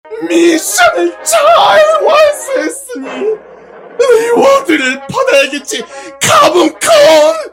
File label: reward